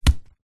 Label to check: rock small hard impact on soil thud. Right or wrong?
Right